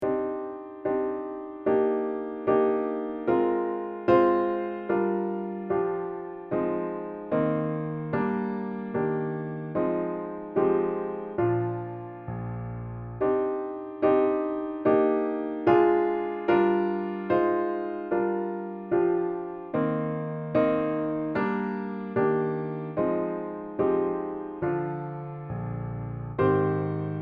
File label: piano